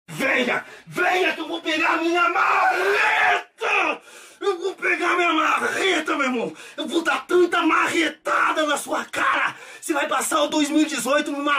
applause

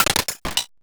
pause back click; Kill La Kill

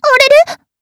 combobreak; niel - amni

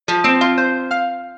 match confirm

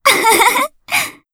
comboburst

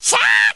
combo break; MonkoEdit

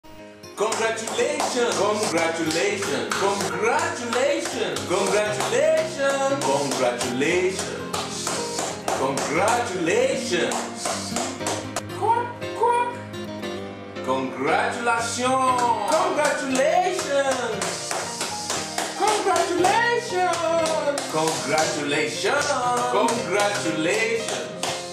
applause; BOIIII idk MIX Skin

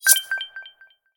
notification; Sunset Horizons; seysant

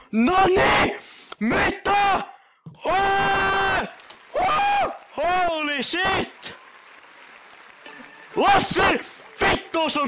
applause; NivenRohulk

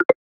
match start; muni muni! v1.1

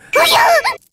section fail; Senko-san-Hideru

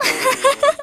match start; Emilia ReZero

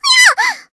combobreak; Whatching CookieNia; Nara

